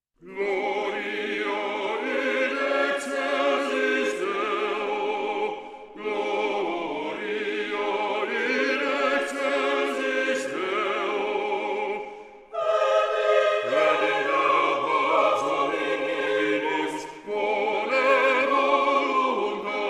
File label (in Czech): vše hlas; lukas missa brevis; gloria